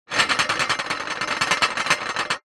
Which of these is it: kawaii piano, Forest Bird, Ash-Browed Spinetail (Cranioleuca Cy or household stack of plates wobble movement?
household stack of plates wobble movement